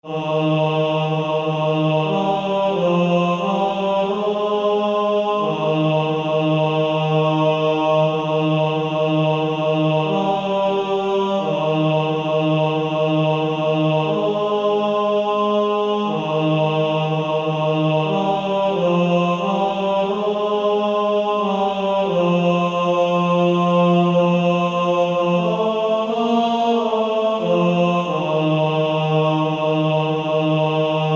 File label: Male Choir; Bass